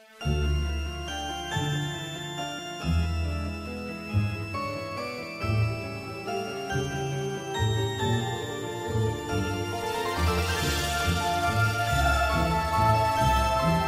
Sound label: waltz